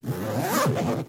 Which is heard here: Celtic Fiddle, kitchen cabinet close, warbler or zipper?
zipper